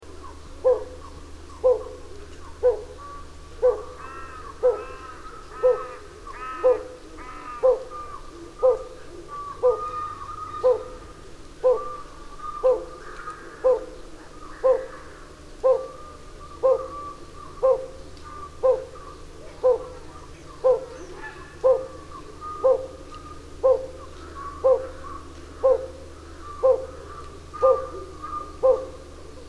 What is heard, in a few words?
Pallid Scops Owl Otus brucei, song, Takhirkol, Kazakhstan